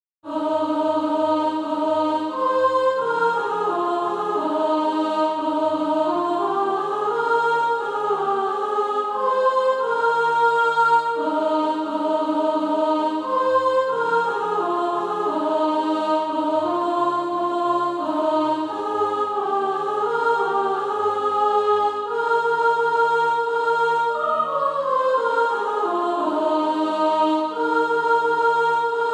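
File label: soprano, SATB Practise Music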